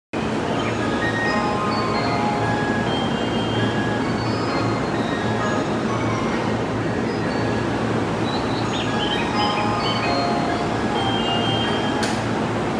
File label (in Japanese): 京葉線東京東, train sounds